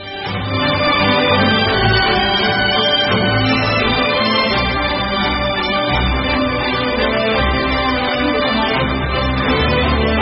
Samsung Note 10 Pro, ringtone

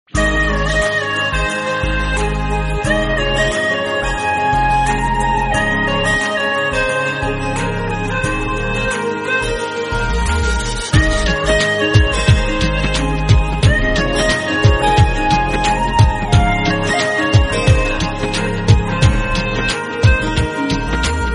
Flute; Rajneethi

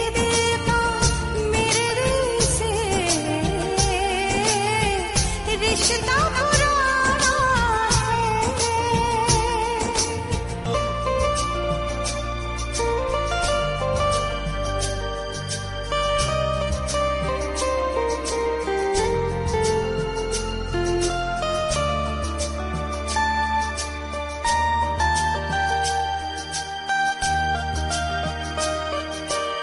song female version

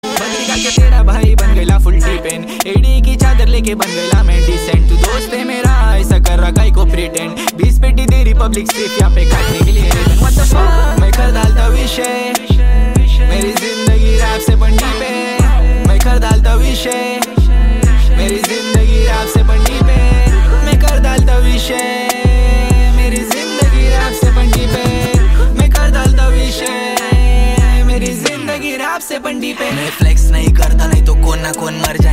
rap, ringtone

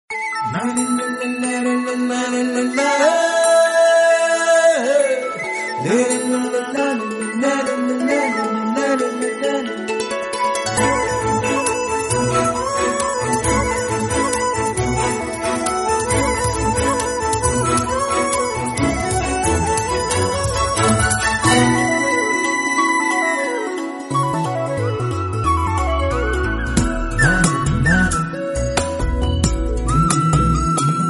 melody tone

